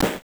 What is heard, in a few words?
Snare; Kid Chameleon; Genesis